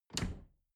dishwasher door snap close

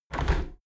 window handle squeak turn close halfway heavy; objekte; fenster

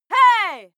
female shout outraged; schreie; menschen

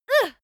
female moan pain very short soft; soundpool

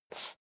step leather shoes metal dry slide short; schritte

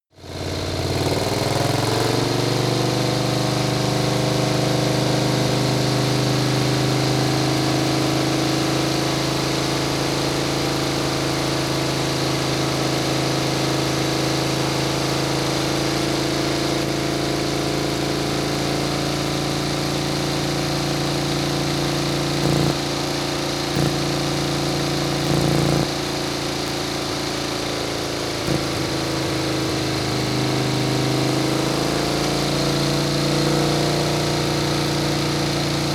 motorcycle harley drive medium speed sequence

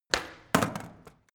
skateboard indoors kick

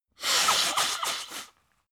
nose blow wiggly; soundpool

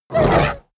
hellhound whimper pain; schreie

